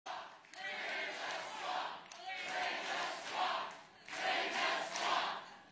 drain

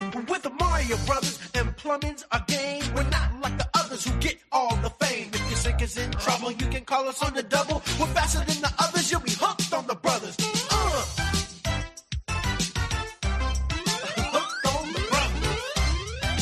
rap; super mario bros super show

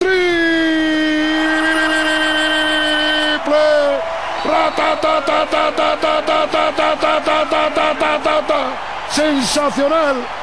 triple ratatatatatatata

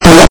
fart loud

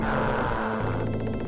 haaaa

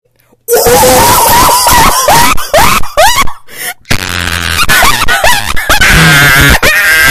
bass laugh

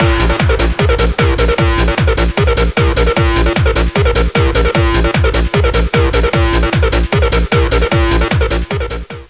rave

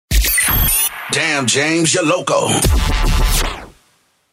Drops

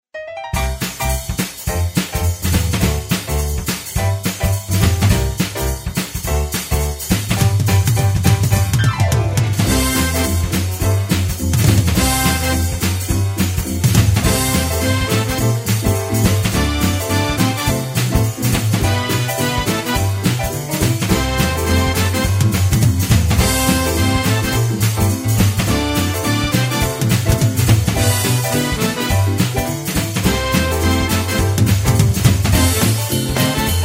Closing Boogie Instrumental

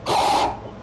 ring noise

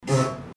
fart in toilet

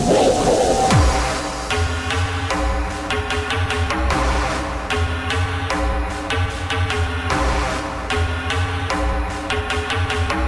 russian hardbass energy

